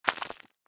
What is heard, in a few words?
bullet light ballistic concrete debris